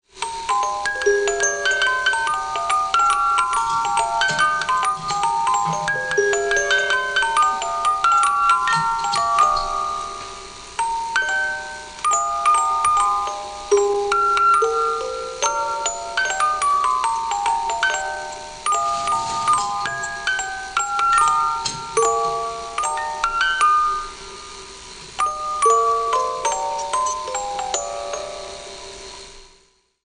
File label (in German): Spieluhr